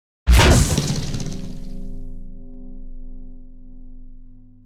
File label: tank ricochet; civ13; civ14; Vehicles